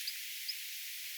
tiklin pikkuaania

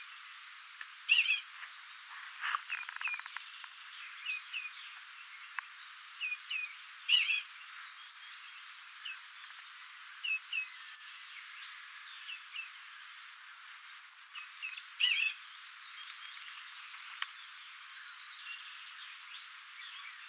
tuollaisia toyhtohyyppaemon aania; töyhtöhyyppäemon ääntelyä

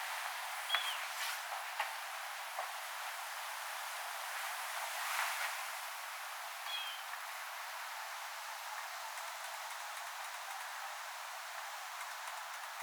tylli ja kapustarintalintujen aantelya

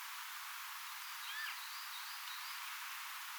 onko tuokin ruskosuohaukkakoiras

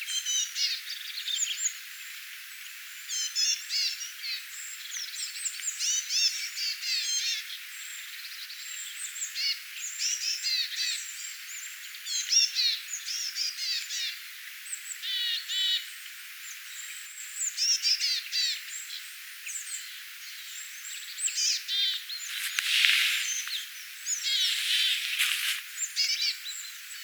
pikkulintuparven aantelya

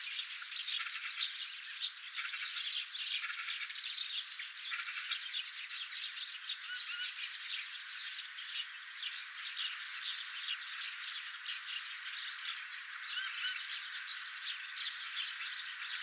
pikkuvarpuset laulavat, räkättirastas vähän ääntelee

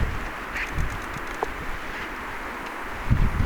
kurpan lentoonlahtoaantely

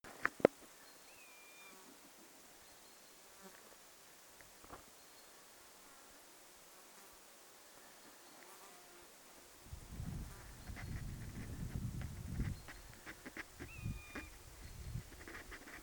mehilaishaukka taivaalla

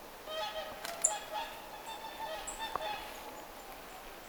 laulurastaan aani lahella

